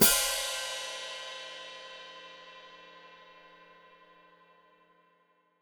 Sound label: Hat Closed Open, Hi-Hat; Cymbals Stereo; Deeply Sampled Drums